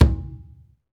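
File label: perc, skin drums; Roland LCDP14 Africa VOL-2; Af.Hand Drm2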